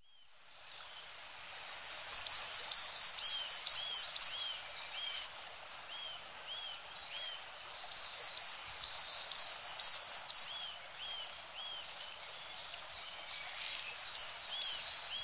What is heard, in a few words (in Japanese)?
higara, birds